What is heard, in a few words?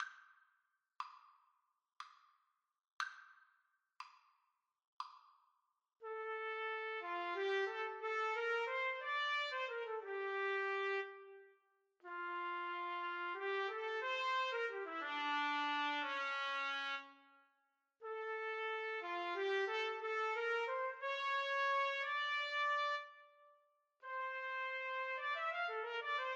duos adagio, trumpet duet